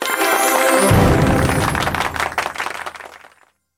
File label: Sting; Super Granny 6